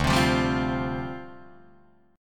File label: D Minor 11th D down Guitar Drop D